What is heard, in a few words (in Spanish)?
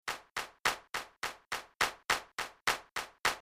Flamenco Buleria 70